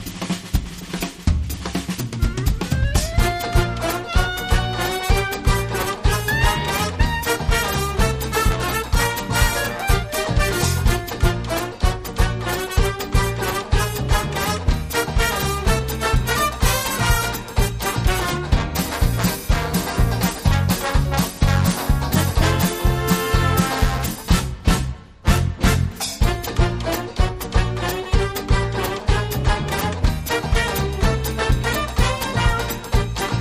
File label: Flappin Charleston